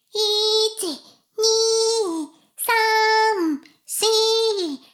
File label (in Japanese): シコシコ音声; 古き城のドラゴニート2